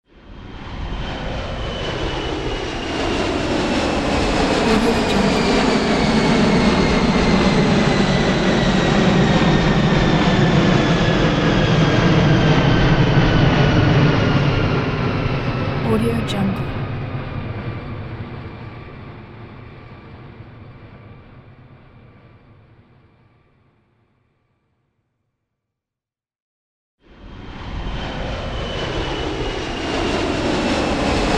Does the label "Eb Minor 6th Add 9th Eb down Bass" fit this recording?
No